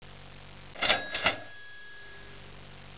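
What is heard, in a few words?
cash register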